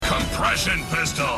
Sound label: Compression Pistol; Optimus; Robots In Disguise television show